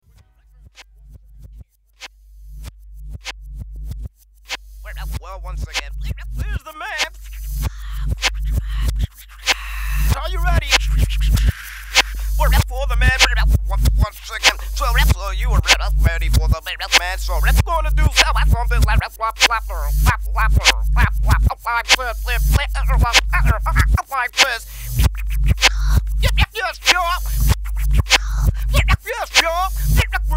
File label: Scratch